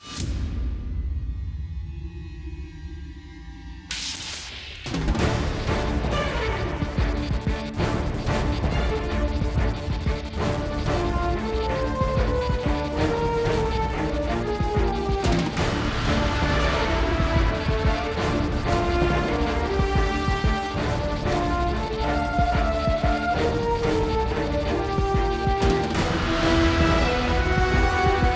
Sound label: Action